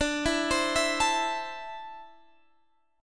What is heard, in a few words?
D 7th Suspended 2nd down Guitar Standard; D7sus2 arpeggiated